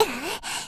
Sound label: attack; Eternal Return; Tia; Voice Line; KOR